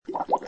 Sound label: bubble